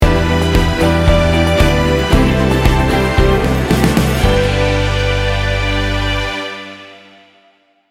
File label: inspiring uplifting soft pop sting bumper